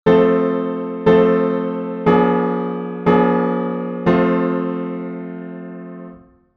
plagal cadence; 16 edo theory; 16-ET

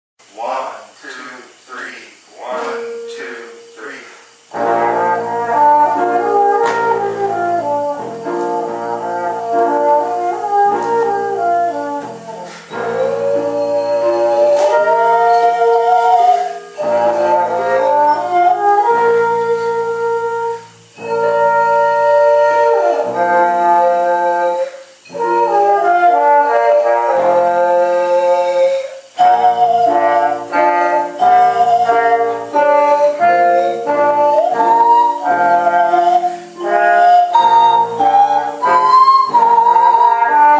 waltz in B flat; praxis

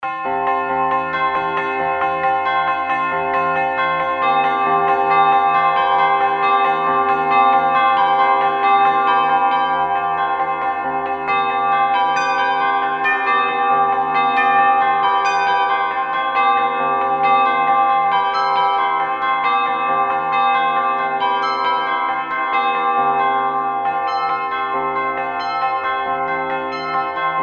bells; bell tuning compare; centaur

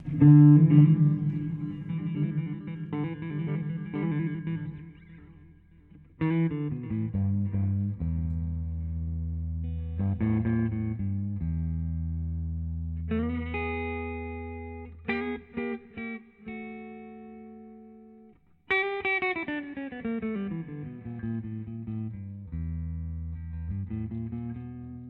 Amplified Bird Walk

